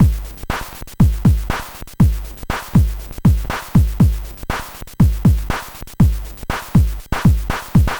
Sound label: NES Drum Loop; Nintendo Entertainment System; Drums; Chiptune; Sonic Foundry (Sony Creative Software)